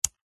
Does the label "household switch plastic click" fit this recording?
Yes